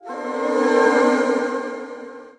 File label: Layered Vox, Female Layered Synth Vox